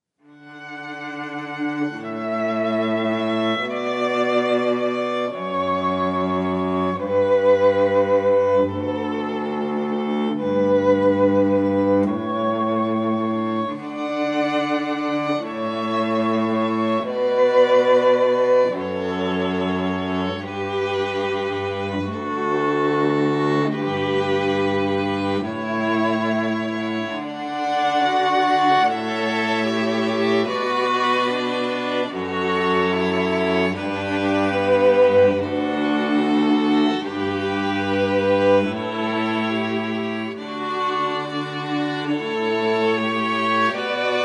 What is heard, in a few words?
Canon; Pachelbel